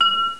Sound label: highlight; ProOne: Netcracker Netscape Navigator